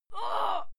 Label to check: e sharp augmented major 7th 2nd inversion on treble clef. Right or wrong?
Wrong